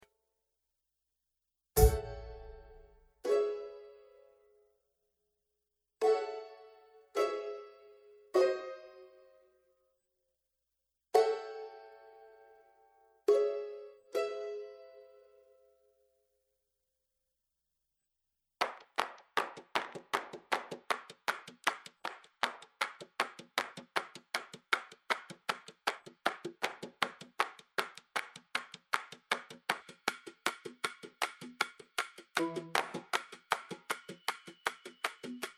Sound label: Instrumental; Auditions